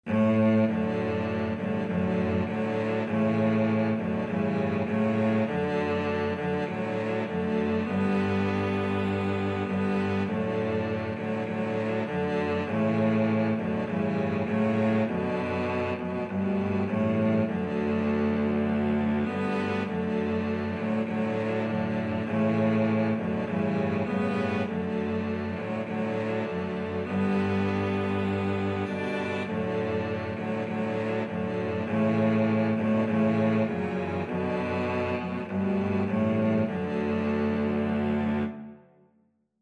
cello quartet

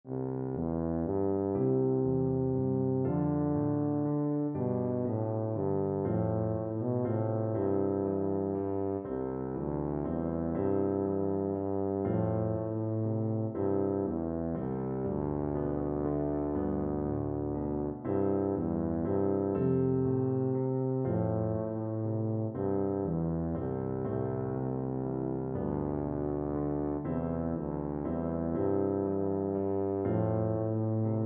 morning has broken, tuba

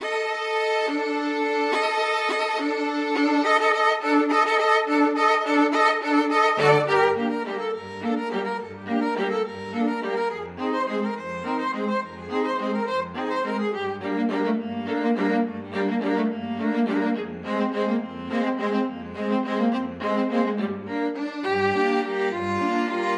danse macabre, string quartet

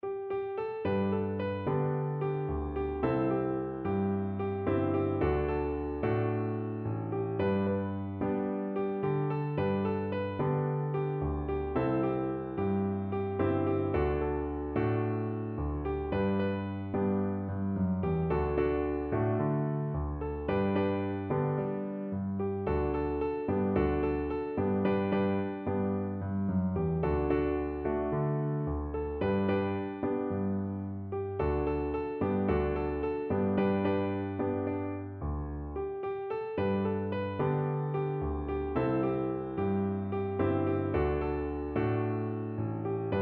singaba, piano

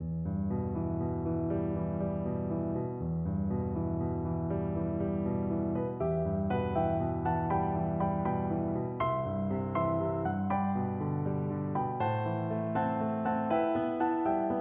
vltava piano

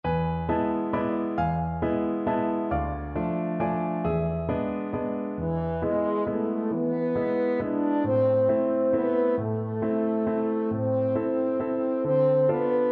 ets harimon, french horn

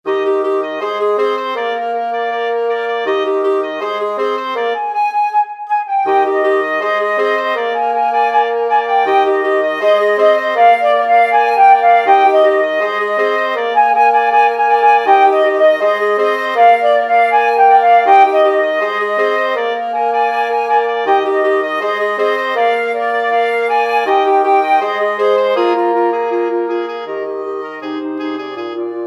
la bamba, wind quartet

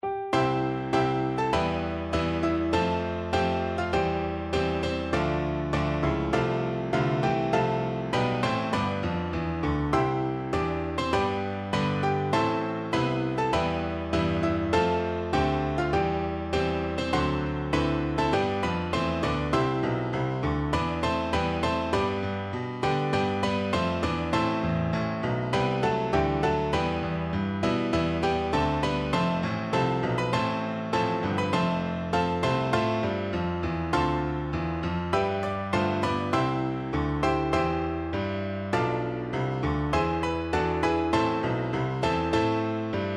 national anthem russia piano

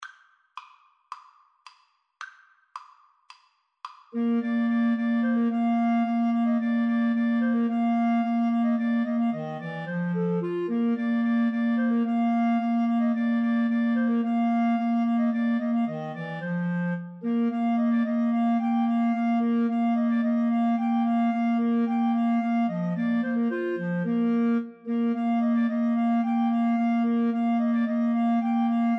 spring, Clarinet Trio

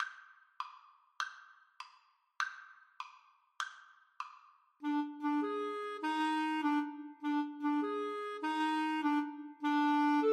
when johnny Flute Clarinet duet

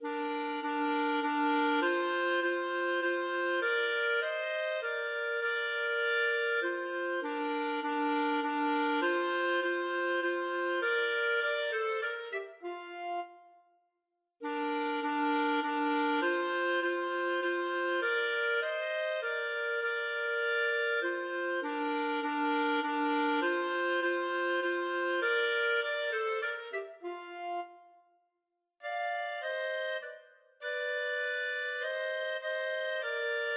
clarinet duet

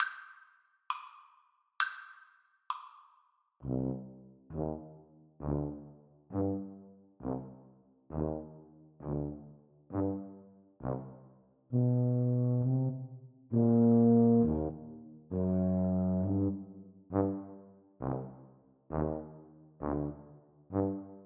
Tuba Duet

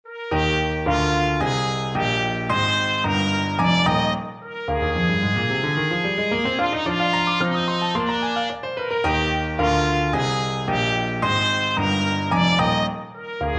trumpet

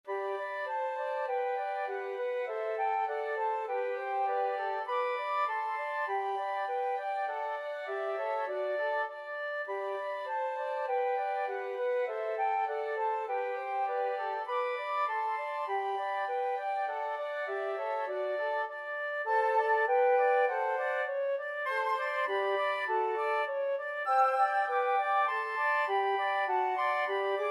melody, flute trio

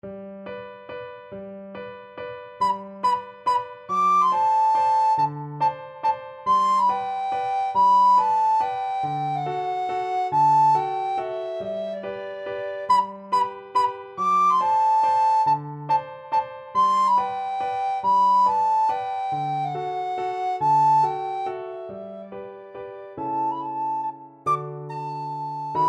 verdi la donna, recorder

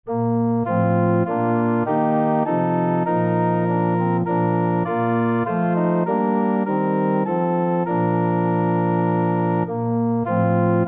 ORG, organ

